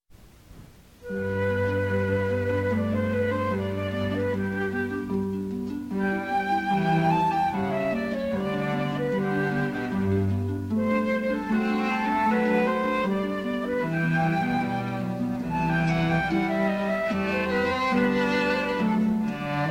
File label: music